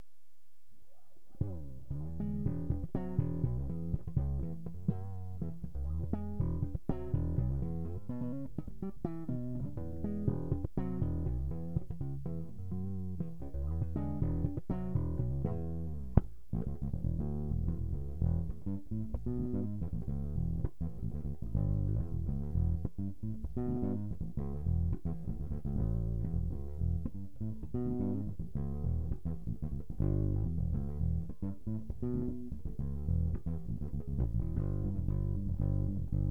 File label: Slap PU Neck EQ on